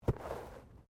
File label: Body movement Fall Slide Light